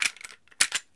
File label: Gun Handling Weapon, Weapons Foley; SFX Kit